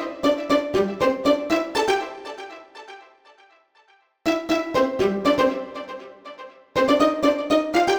Pizzicato, 120bpm; Zero-G; Nu Disco; Nicengroovey C

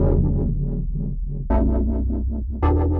Synth Phat; Sonic Foundry (Sony Creative Software); Knutrix Drum & Bass

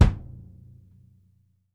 ROOM, Drumworks; Sampleheads; New York City Drumworks VOL-1

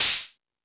Crash, Drum Machines E-MU Pro-Cussion

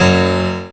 PNO C#, FLUEGEL BASS; Best Service ProSamples vol.55 - Retro Sampler; AKAI

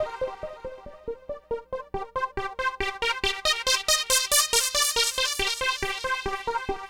Trance Synth

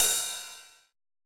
cymbal, Roland MC-909

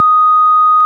ORG, Farfisa

